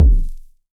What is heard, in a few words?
kick smashed broken deep